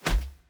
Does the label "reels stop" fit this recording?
Yes